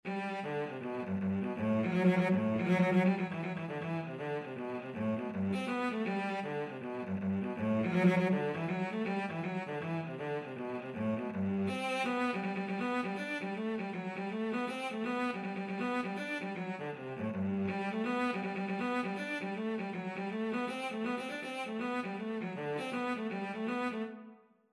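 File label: violoncello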